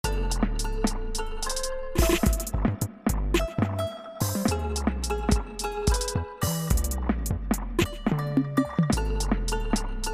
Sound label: Stringz